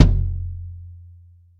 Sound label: long tail reverb kickdrum e key; kick drum samples